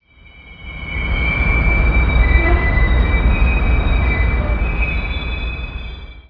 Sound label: train; Spec Ops 2